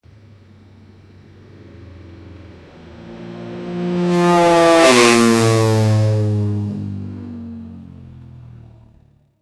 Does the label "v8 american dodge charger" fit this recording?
Yes